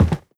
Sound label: step light, footsteps cardboard